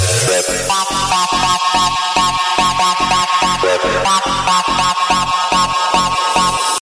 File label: breakbeat